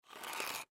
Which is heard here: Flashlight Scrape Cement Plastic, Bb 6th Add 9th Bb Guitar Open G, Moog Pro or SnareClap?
Flashlight Scrape Cement Plastic